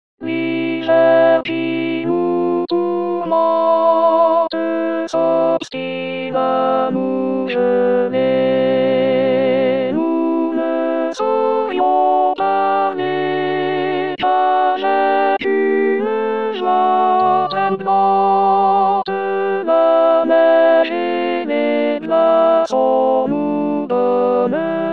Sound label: alto